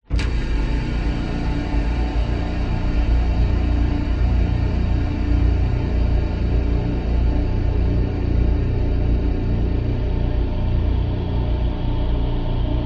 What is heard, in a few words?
horror atmosphere dark sinister hit into drone long slow decay